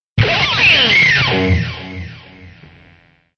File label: slide; slide 3: quite aggressive